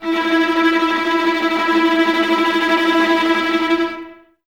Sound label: VLS E Tremolo, Violas, String Ensembles; Miroslav Vitous